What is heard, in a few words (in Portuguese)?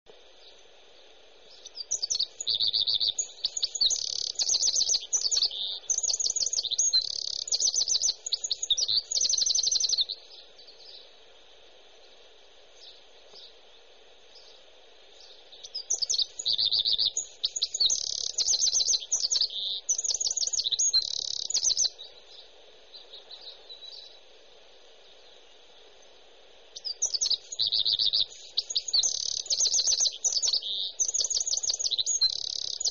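Carriça; Aves de Portugal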